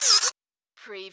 Servo Panel Sound - Servo

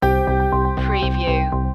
positive vibe stinger

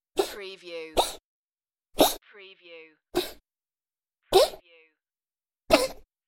Cat Choke; beanstalk audio

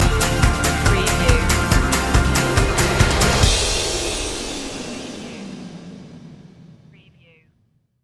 upbeat country fun stinger

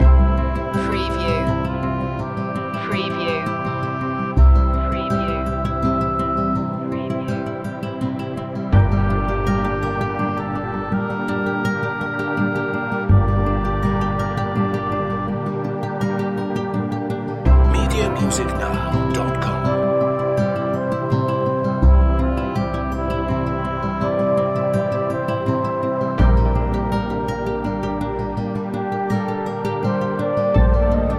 oboe in the woods loop

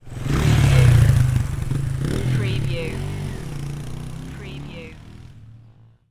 Motorcycle Dirt Bike Drive Away

